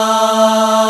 Aahs A2; Proteus 2000; Digital Sound Factory Proteus 2000

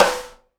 SNARE RIM, Live Snares

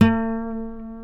B NYLON, Guitars

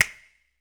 HMM, Human Perc, Body Perc; Spectrasonics; Supreme Beats; World Dance

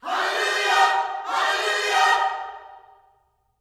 HALLELU, Gospel

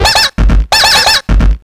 CARTOON, Hard & Loud Techno; USB Soundscan vol.01; AKAI

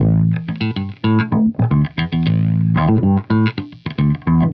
Bass, Wah; Bass X

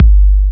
BD, Dance Mega Drums, HIP

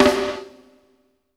FLAM VERB, PROC SNRS, Drumworks; Sampleheads; New York City Drumworks VOL-1

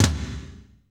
TOM, Drumscapes Roland

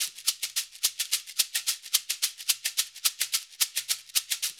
LOOP, SHAKERS; InVision Interactive; Percussion Slam

